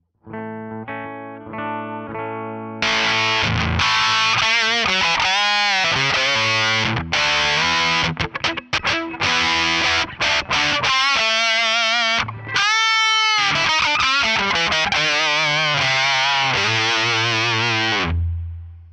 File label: Hammer Fat, Distortion Brutal High Gain Distortion; Black Hammer Distortion Brutal High Gain Distortion